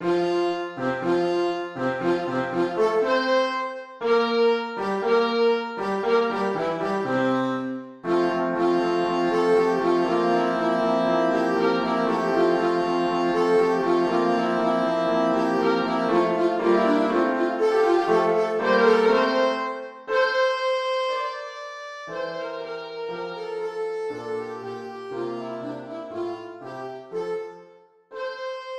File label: alto sax